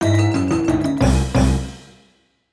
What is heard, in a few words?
Jingle, Failure; Candle Bear